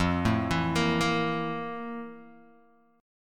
F Major 11th Guitar DADGAD

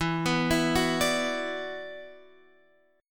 E 7th Suspended 2nd E down Guitar Standard; arpeggiated; chord; JGuitar

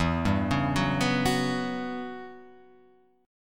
E Minor 6th Add 9th guitar chord, Open F; Em6add9 arpeggiated; JGuitar

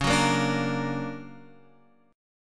D Augmented Major 7th, chord

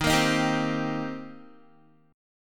Eb 6th Eb down Guitar; strummed

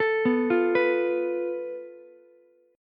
F Major Flat 5th, Ukulele; FMb5 arpeggiated; JGuitar

